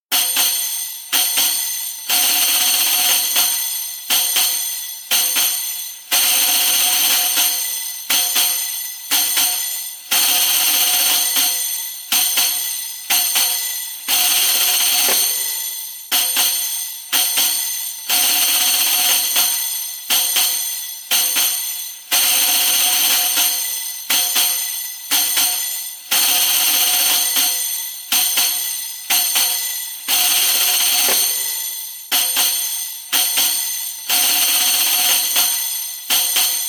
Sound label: Riqq Rolls (fast) spoken, Djembolale; Djembolale - Fills and Drills